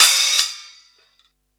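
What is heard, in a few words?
open hi-hat; eddyflux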